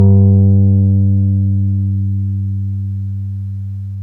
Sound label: PNO, Roland Keys of the 60s and 70s, Wurly; Roland LCDP10 Keys of the 60s and 70s 2; Wurly 200